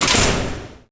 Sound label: door metal bars locked; Max Payne